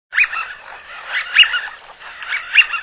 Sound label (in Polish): świstun, głosy